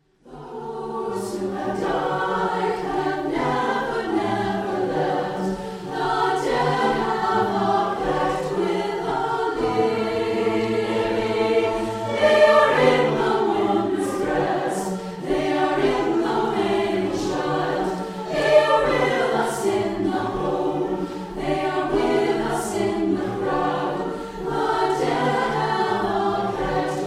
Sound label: breaths